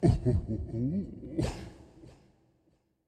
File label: Toxic Mask Small Laugh